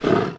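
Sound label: Boar Damage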